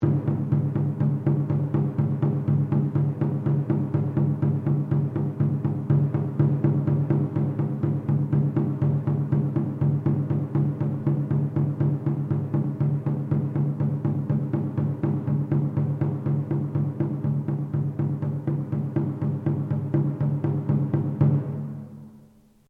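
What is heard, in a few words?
Drum Taiko mezzo forte Sequence, Japanese Taiko Drum; Miscellaneous Musical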